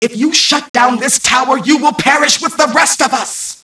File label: shutdown